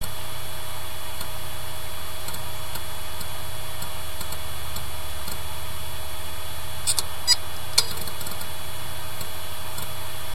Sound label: ibm type1